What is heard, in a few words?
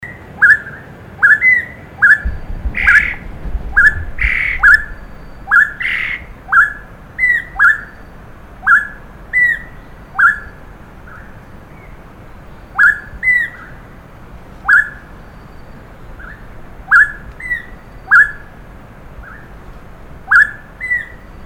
Grey Sided Scimitar Babbler Song; Chant de Pomatorhin de Swinhoe; Chant enregistré le 08 mai 2012, en Chine, province du Fujian, réserve de Dai Yun Shan